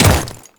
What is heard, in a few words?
Ice Step; Enemies; Footsteps; Warframe; Grineer Royal Guard